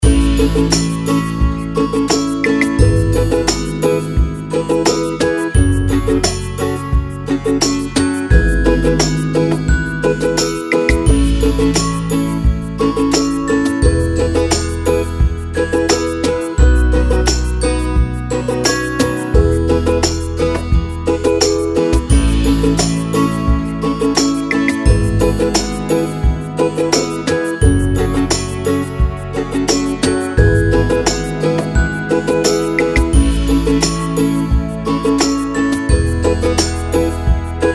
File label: Happy Menu Loop